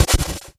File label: pickup